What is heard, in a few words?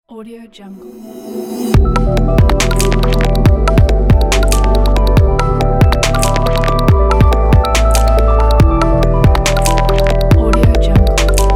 minimal background electronic